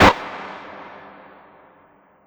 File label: PERC R, Drum & Bass 160 BPM; Ueberschall; Drum & Bass Flash Trackz